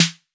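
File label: 808 snare drum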